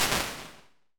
Hard Ambience, impulse responses, small spaces, rooms; Apple